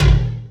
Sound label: Sample, Roland MV8800, BREAKWILDKIT